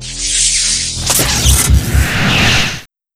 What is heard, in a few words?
mangler over charge